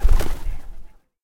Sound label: flying away bird; game